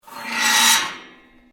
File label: Metal plates scrape; Material components to create more complex sounds; Materials